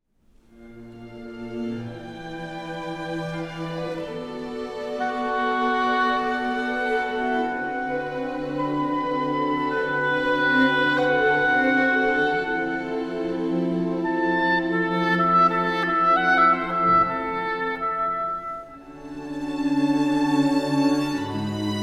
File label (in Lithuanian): Koncertas obojui, klavesinui ir styginiams